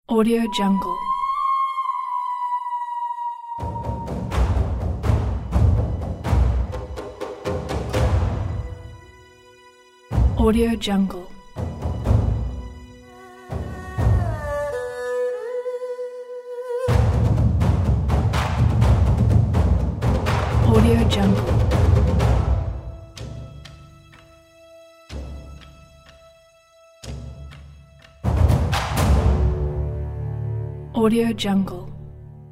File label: sparse taiko drums and eastern melodies